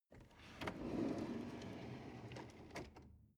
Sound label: drawer medium open draw long